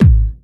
warm sounding kick one shot A key

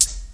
1980s crisp hihat a sharp key

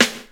piercing snare sample a sharp key

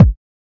2000s subby kick drum single shot a sharp key

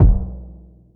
smooth bottom end bass drum sample b key

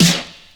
sparkly acoustic snare sample e key; snare drum samples

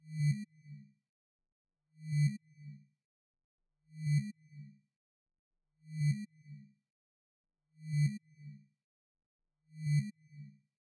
wobble delayed bass house 124 - G sharp m; instruments; loops

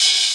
soft open hi hat sound g sharp key; open hat samples

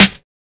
subtle reverb acoustic snare c key

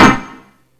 reverb acoustic snare b key

